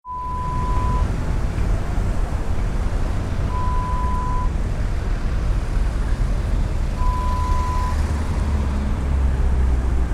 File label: City Traffic sounds LONDON